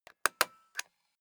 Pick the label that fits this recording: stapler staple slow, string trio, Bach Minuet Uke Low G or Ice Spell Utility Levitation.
stapler staple slow